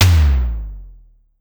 Electro Tom 1 Lo, Clavia DDrum AT, Pitched Down

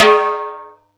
Yamaha EX5, Drums

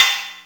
DRUM UST CYM; SP-12+1200; Greytsounds VOL-1

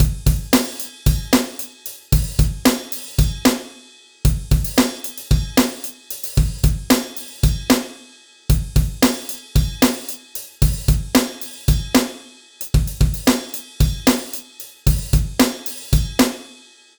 Beat, Drums & Percussion; Electrocution; Beats

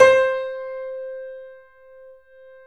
C, 3-D Audio Collection, 3D Pianos, Yamaha C7

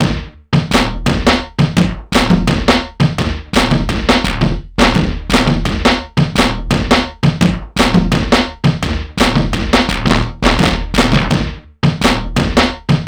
INDST; Funky Xtreams; INIS

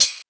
Tamborine; Sonic Foundry (Sony Creative Software) - Stylus Pressure Urban Grooves on Digital Wax; Alien Tribe

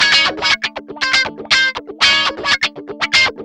GUI, 120 BPM

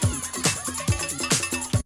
BREAKBEATS, TECHNO

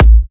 A, kicks; markus hakala kicks

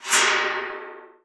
sfx perc

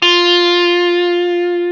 GTR TUBE, Dirty; Club-50 - Foundations Roland

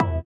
sitar, Indiatek; Spectrasonics; Metamorphosis

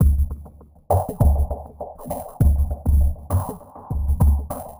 Ghost Loop 100BPM, drum loop

